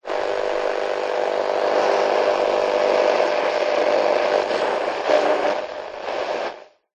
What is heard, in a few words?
radio static buzz hum